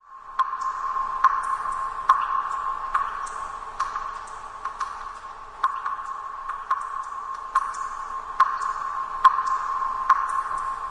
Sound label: droplets; nature